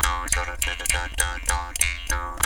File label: JAW GROOVES, Didgeridoo